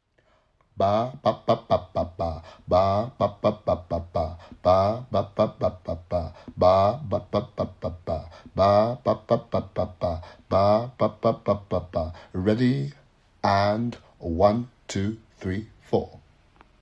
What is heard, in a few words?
Ba ba ba ba ba ba rhythm workshop